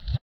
slip slap; Spectrasonics; Metamorphosis

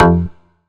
Bass F, Bass Synth

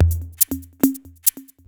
808 Loops, Drums Beats

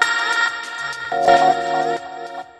LOOP, Akai MPC5000

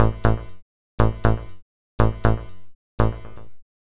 Machine Bass; Sonic Foundry (Sony Creative Software); Club Joints and Street Anthems; Ruff Side

